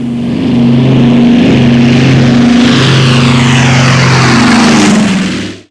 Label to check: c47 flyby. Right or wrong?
Right